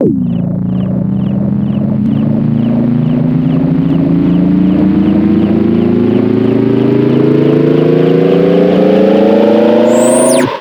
polycraft charge up

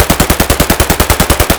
akm distant; Escape from Tarkov

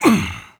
vce effort throw

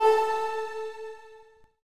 Positive Dink; Civ4 beyond sword

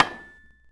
Hit Metal Spike; Civ4 warlords

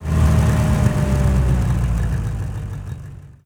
engines; World of Tanks

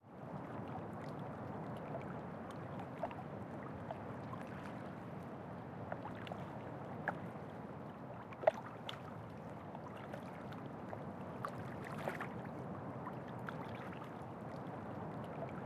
ambient port default; World Of Warships

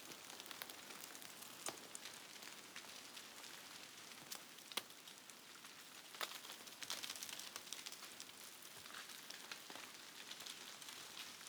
ambient; World of Tanks

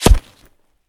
body armor close; Escape from Tarkov

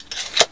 Inf Weapons Foley 3rd; Red Orchestra 41-45

